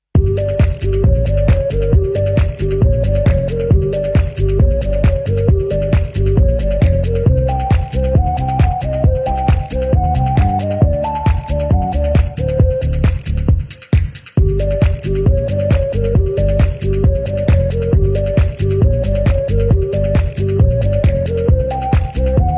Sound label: ringtone instrumental